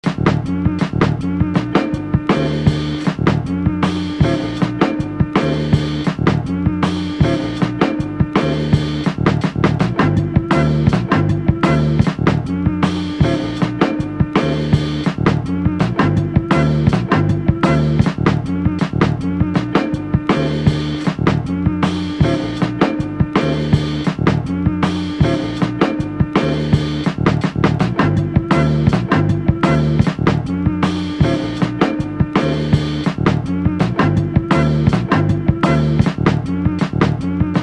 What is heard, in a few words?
beat break